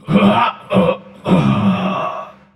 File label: Death